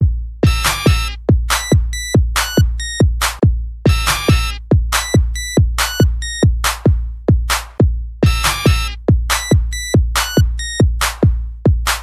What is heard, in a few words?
Ringtone Beat